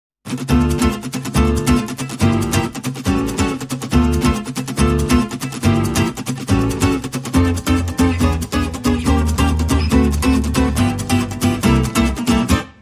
Jazz Club, No Drums No Claps No Lead Guitar No Accordion